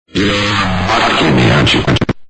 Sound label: buzz; freak fortress 2